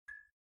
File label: Lightbulb